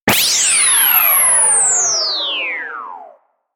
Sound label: ATTACK